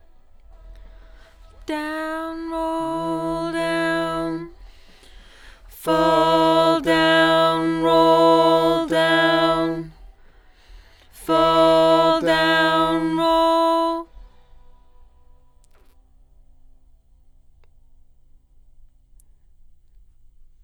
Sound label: LdVOX Double